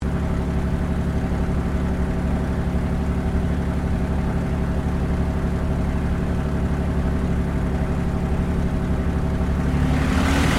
AIRCRAFT CAC CA-18 MUSTANG MK23 PREFLIGHT INTERIOR; CA-18 /P-51 Mustang; Airplanes; Vehicles; Aircraft Collection; Twistedtracks